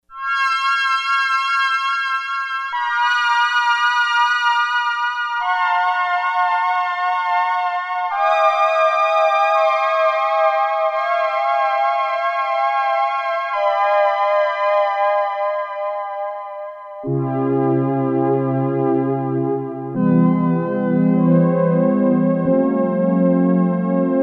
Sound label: '80s Sad Strings, Sequential Circuits Prophet-5; Sequential Circuits Prophet-5 (Ver.3)